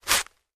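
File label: Toilet Paper Tearing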